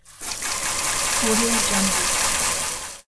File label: electro water